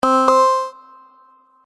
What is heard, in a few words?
Approved Interface Sound, chirp